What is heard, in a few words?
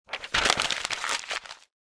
paper rustle